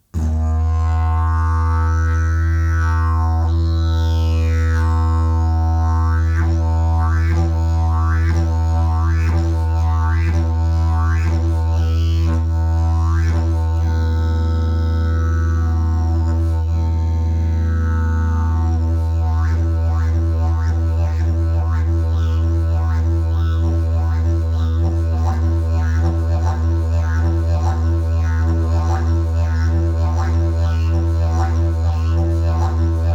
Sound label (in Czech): javor, didgeridoo